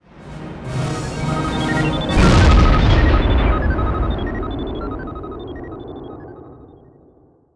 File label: TADA; allegiance